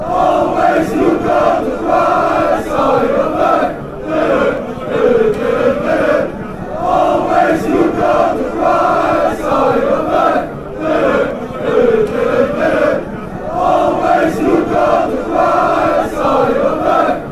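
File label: football chants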